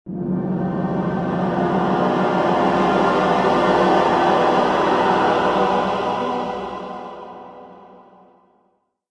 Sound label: Modern Foreboding; Civilization Revolution; Xbox